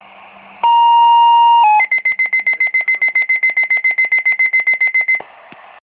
Fire tones

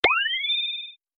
email notice, ringtones sms

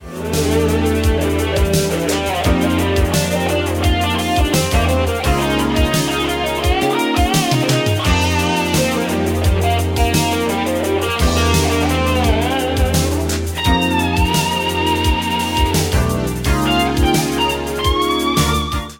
guitar

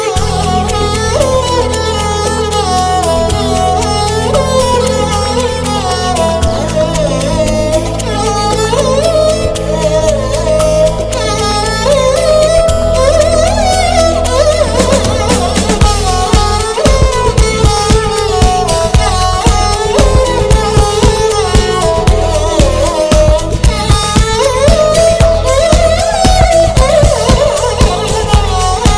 Sarangi Best Fussion

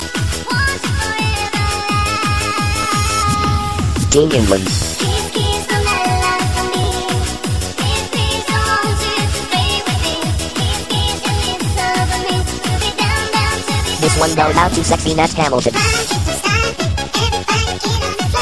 melody

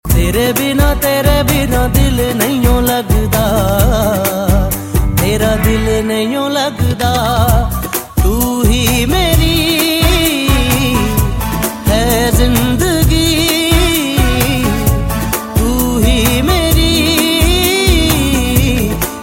unplugged

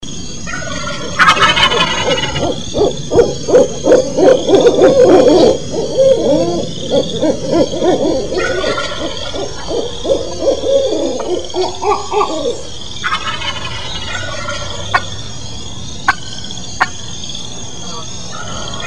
turkey owls; animals

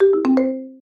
text tone; gta ifruit

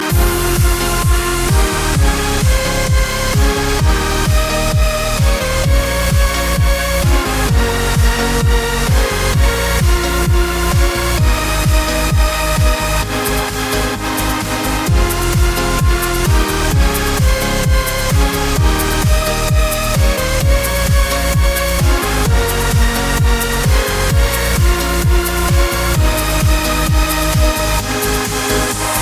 electro rmx

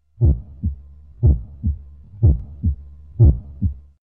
heartbeat sms; ringtones

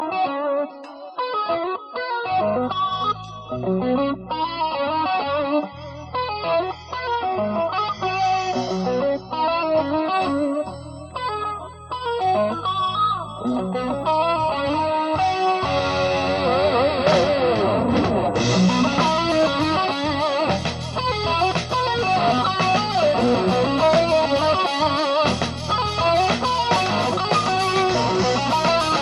remix, hip hop ringtone

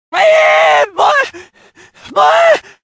heavens sound

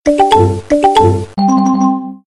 messenger sound

